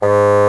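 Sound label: Bassoon mf G#2; Digital Sound Factory Virtuoso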